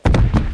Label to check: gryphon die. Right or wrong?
Right